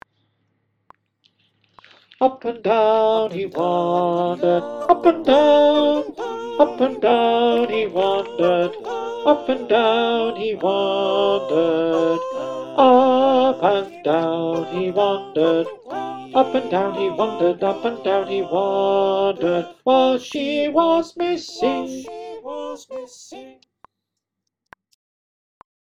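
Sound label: tenor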